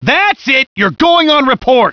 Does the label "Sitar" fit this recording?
No